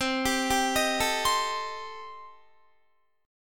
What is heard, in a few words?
C Minor 6th, C, down, Guitar; Cm6 arpeggiated